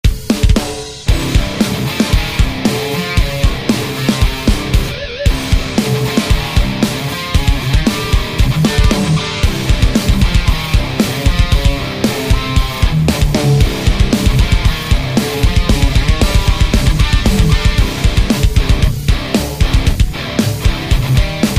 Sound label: amt electronics ss20